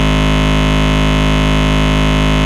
Ultimate Bass G# Root G#; Vengeance Samples

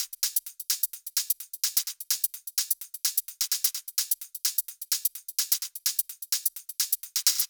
128BPM Machine Kit

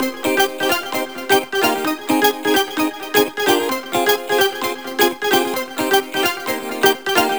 130BPM Melody; Vengeance Samples; Comboocha Kit

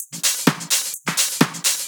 House loops 128BPM; Vengeance Samples

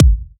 Soft Kicks; Vengeance Samples

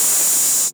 Cymbals Open HH; Vengeance Samples

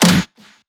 Pre-Shifted Claps; Vengeance Samples

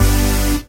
Synths G; Vengeance Samples

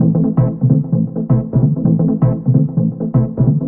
Root A 130 BPM; Vengeance Samples; VEH3 Special Sounds

